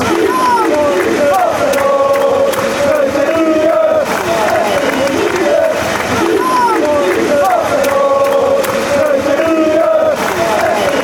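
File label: bis chant, football chants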